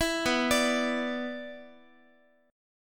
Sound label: B Minor Double Flat 5th B Guitar, chord; Bmbb5 arpeggiated; JGuitar